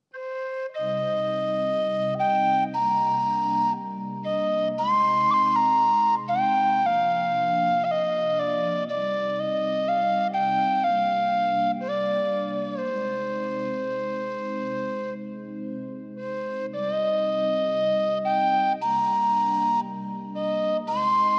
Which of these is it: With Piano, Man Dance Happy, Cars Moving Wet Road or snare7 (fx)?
With Piano